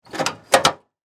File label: Lawn Mower Shift, Industry, Lawn, Mower, Shift, Gear, John, Deer